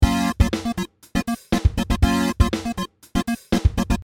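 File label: synth Chorus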